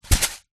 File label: epsom salt in card box down on surface; foley